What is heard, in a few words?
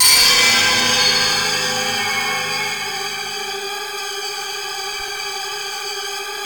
G3 ACID; Northstar; The Wizard VOL-1; ORCHESPHERE 1